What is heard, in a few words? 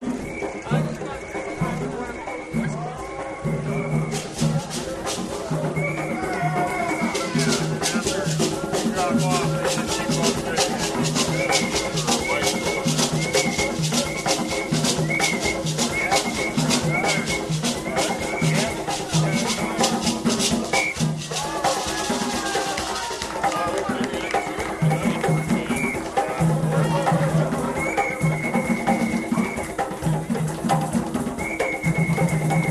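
African Drums Party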